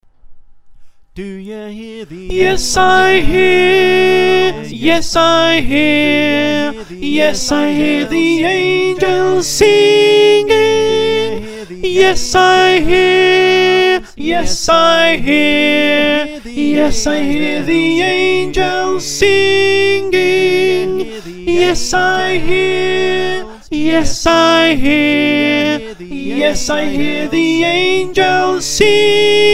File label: Soprano